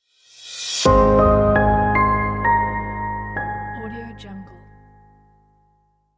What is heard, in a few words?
a piano logo